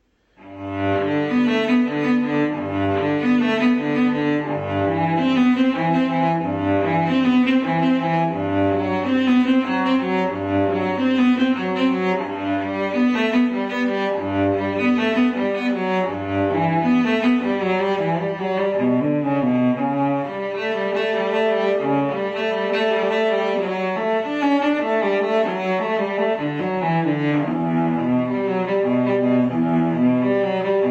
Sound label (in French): Prélude de J.S. Bach